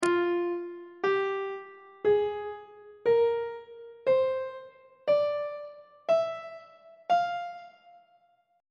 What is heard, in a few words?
f melodic minor scale note positions; on treble clef